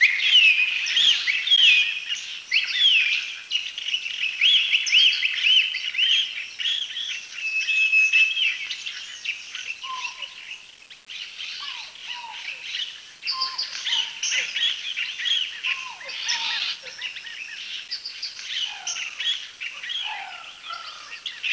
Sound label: jungle amb loop; mine